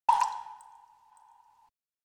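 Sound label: water drop solo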